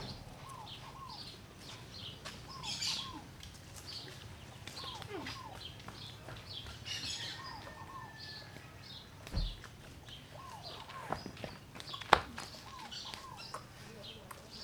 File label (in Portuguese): Ambiente aldeia a tarde com barulhos domesticos; ambiencia rural